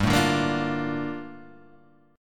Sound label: Bb Major 7th, G; Bbmaj7/G; strummed; JGuitar